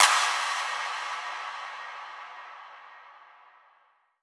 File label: Impacts, Effects